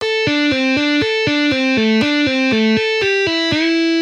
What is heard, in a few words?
String skipping, guitar